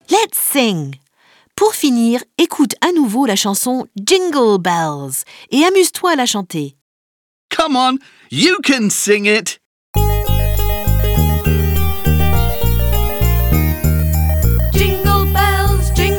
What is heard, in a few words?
Lets sing Jingle Bells karaoké; I Love English News